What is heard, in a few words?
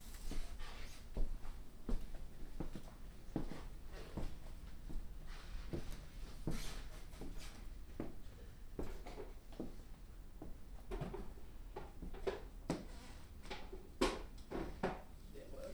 Creaking Floor; Working With My Grandma 4.28.2016